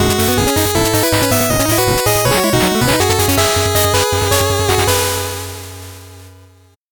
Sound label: 8bit jingle normal